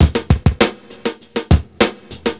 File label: Drums C, funk; сэмплы ударных